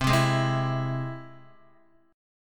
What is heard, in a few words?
C Augmented, Guitar, chord; strummed; JGuitar